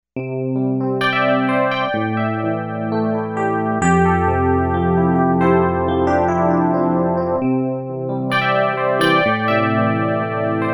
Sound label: Digi Piano